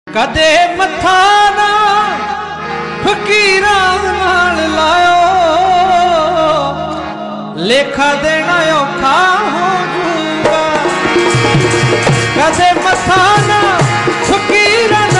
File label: punjabi